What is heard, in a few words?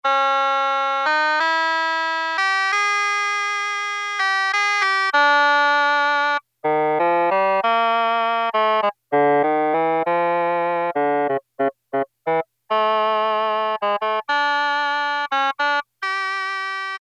Yamaha DX7 OBOE